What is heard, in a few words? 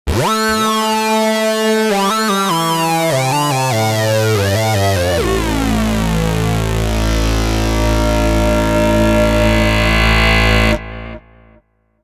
Sequential Pro 3 Oszillator Sync Wavetable